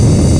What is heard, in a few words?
ENGINE; Big bang